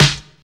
sharp hip hop acoustic snare sample d key; 1990s; snare drum samples

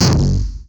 short reverb bass drum single hit f sharp key

